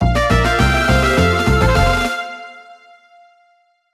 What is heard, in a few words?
Level Goal; Donkey Kong Country fan remastered